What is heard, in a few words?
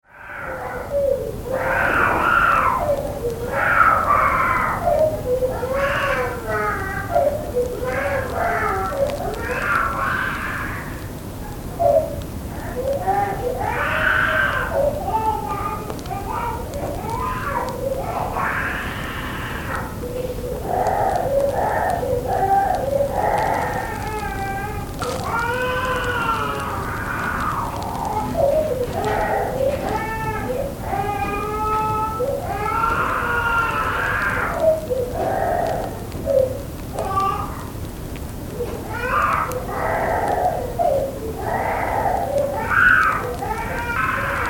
Baby Having Tantrum, toddler crying and screaming - recorded thru wall; in another room; Tom Hutchings